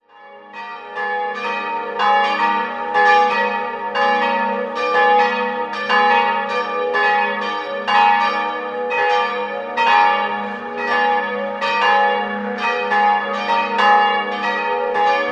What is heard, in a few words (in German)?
Glocken, Frauenberg, Pfarr- und Wallfahrtskirche Mariä Geburt; 1949 von Johann Hahn in Landshut, die kleine 1772 von Johann Erhard Kissner in Satdtamhof gegossen.